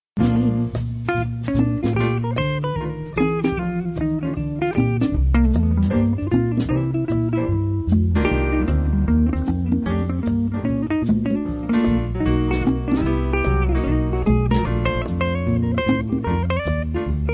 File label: solo